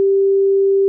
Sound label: bigwin, Slot; Huff-Style